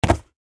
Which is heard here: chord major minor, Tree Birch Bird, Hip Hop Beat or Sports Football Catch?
Sports Football Catch